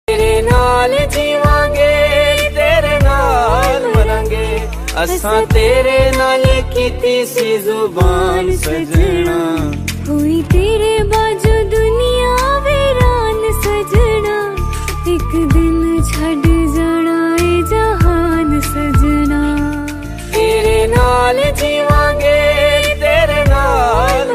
Tik Tok Ringtone

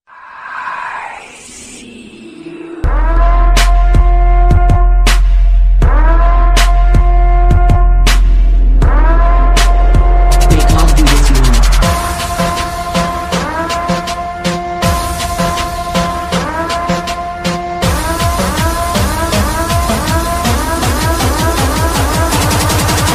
Scary Ringtone

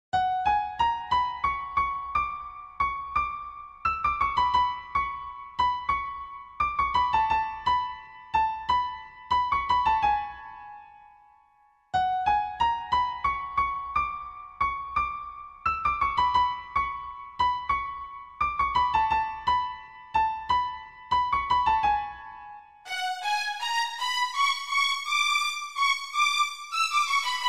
Bgm Ringtone; Oh My Kadavule

